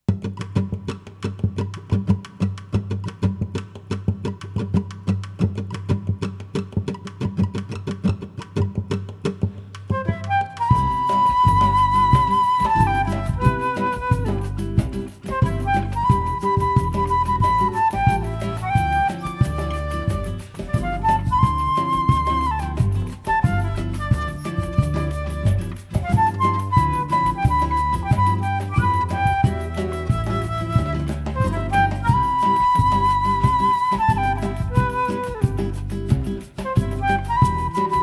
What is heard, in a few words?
Samba